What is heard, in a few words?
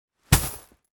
selling action